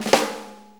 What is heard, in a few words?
4-4 Snare Flam, Drum Loops; Drum Tools; Flams